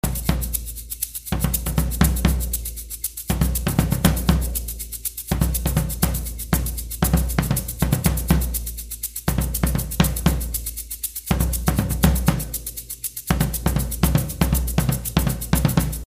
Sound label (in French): Afro Cubain Bass, Batucada